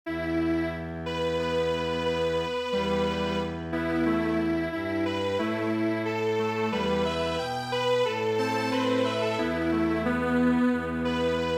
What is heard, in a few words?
Strings Quartet; midi; traditional